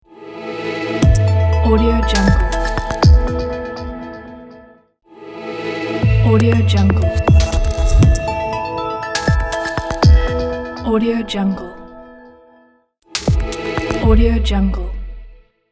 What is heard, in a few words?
intro maker glitch logo